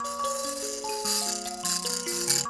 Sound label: PLK Bush Mbira; Roland LCDP11 Africa VOL-1; M'biras